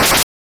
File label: cut, attack sound; カタナ１